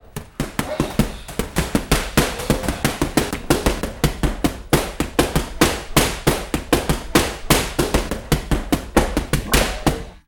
Muay thai academy fighter training punching bag continuous punching